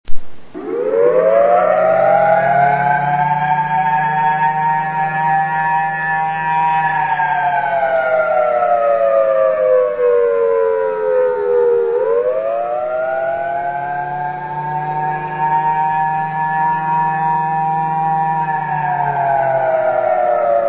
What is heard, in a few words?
thunderbolt, sirens; glassport